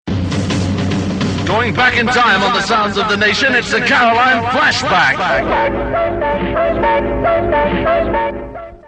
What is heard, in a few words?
jingle; Radio Caroline; Going Back In Time